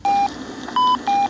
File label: beep boop